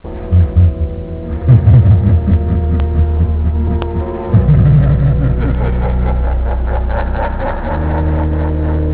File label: haunted laugh